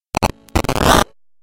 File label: robotic glitch